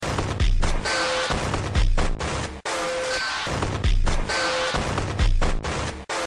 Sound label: industrial